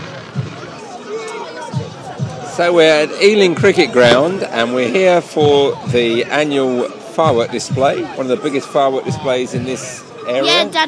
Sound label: at Ealing fireworks